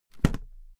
briefcase drop close; industrie wirtschaft; bueroarbeit

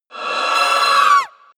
wraith die; schreie

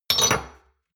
shot glasses stack hard; soundpool

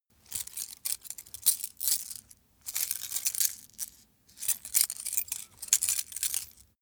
small metal handling; industrie wirtschaft; metall

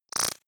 splutter short, zaps buzzes

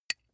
small metal impact soft damped; industrie wirtschaft; metall

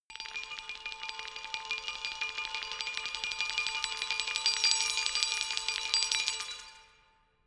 glockenspiel mysterious tremolo low; percussion

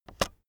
keyboard external space release, computer; kommunikation

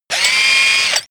drill dull; maschinen; bohrer; soundpool

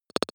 click stutter, signale; soundpool

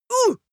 female fight shout pain short; schreie; menschen

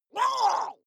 water monster female gargle shout attack short; comedy-cartoon; soundpool

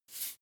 salt shake single; berufe; gastronomie

